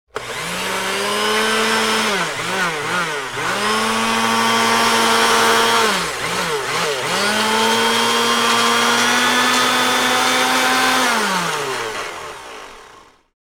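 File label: mixer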